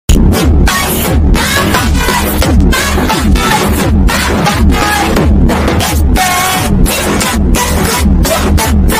mega bass

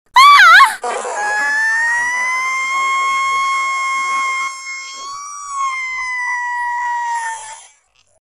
female scream

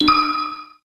menu select; melee

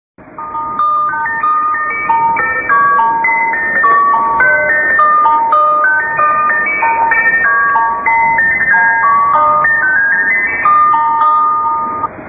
eas alarm; allemagne

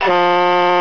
startup

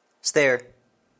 stair; placing stair

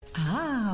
ahhhhhh

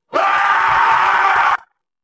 screaming

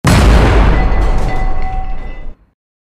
tank shot sound; clash of panzer

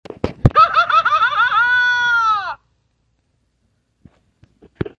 hahaha

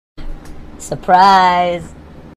melody surprise

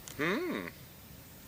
mmmm

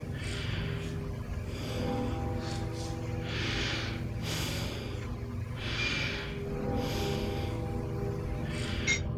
girl breathing; lethal comapny

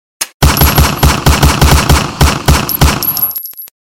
gun

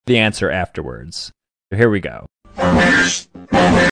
robot

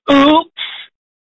oops

